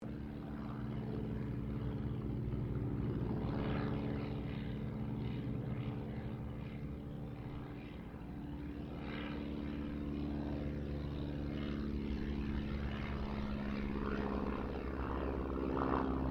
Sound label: AIRCRAFT DH82A DEHAVILAND TIGERMOTH PASS DISTANT; 1936 Tigermoth; Airplanes; Sound Effects:Vehicles